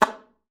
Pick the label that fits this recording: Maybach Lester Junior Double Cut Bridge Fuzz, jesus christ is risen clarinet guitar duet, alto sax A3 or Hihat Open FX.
alto sax A3